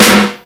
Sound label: SNARE, Ragga & Dub Reggae